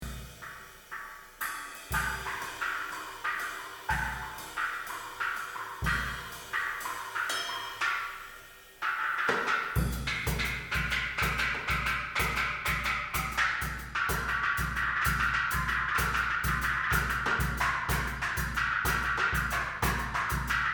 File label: Percussion Duo